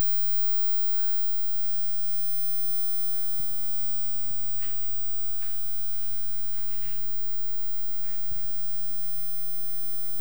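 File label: test function generator 21k altec 215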